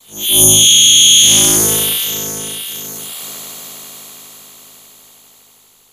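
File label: Arcade Start Up, drones tones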